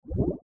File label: smoke; RallyX